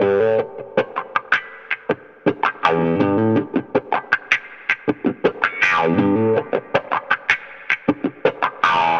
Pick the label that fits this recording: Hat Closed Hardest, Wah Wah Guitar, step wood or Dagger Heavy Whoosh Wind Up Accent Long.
Wah Wah Guitar